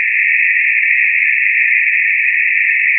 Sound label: green noise